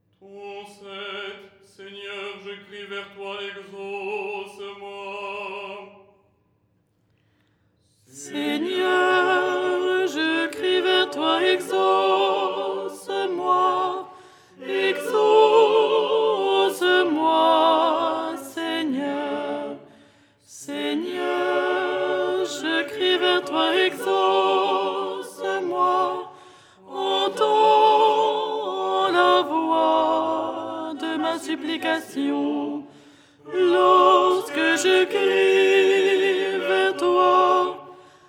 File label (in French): alto; " Seigneur je crie vers toi… "; Les 8 tons en français